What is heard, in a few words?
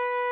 b4 Short, flute tones